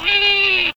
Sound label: ow